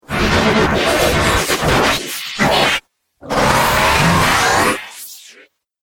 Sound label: Dubstep Scifi Effects